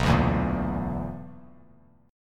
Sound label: B-Minor Major 7th Double Flat 5th, Bass Standard; BmM7bb5 strummed; JGuitar